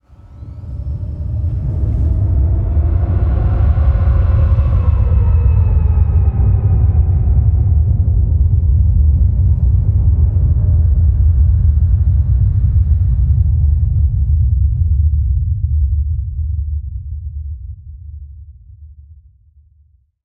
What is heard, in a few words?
Work Room Water Drain